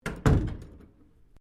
DOOR WOOD INTERNAL ROOM CLOSE, Sounds onboard HMAS Castlemaine; Boats (Sound Effects:Vehicles)